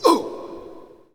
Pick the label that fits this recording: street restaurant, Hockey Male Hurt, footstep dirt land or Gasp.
Hockey Male Hurt